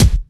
shiny kick drum D# key, Royality free bass drum single shot tuned to the D# note; D sharp key; one shot